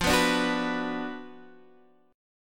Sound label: Gb Major 7th Suspended 4th Sharp 5th F, chord